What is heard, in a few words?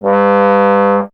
trombone; Miroslav Vitous; Solo Instruments